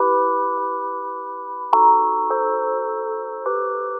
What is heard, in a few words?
keys, Sad Loops